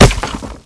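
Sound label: grass; Mafie 1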